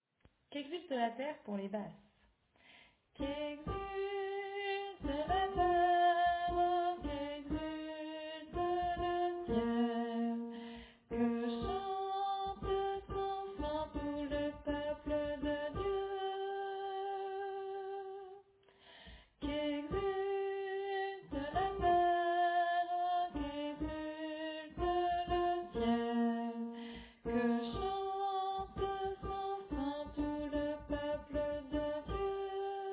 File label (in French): Basse